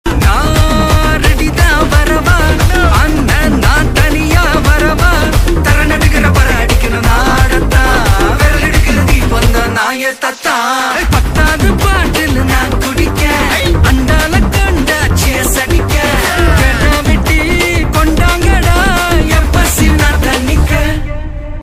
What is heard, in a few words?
Tamil ringtone